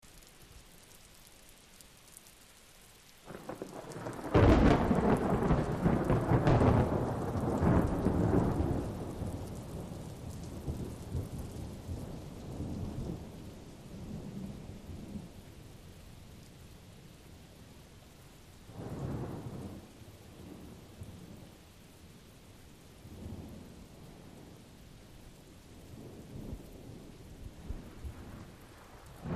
Continuous thunder with rain ( Blue Mountain Lake, Adirondacks, N.Y. )